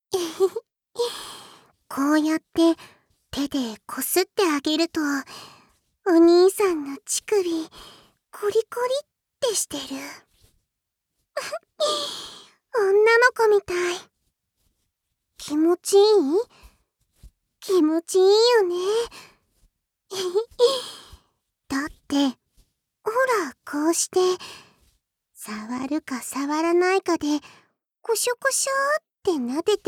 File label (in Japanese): フェラパート, ASMR